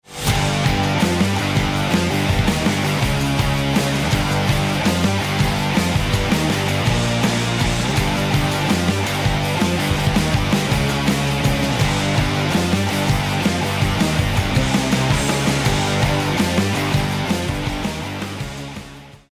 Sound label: start action, music; hades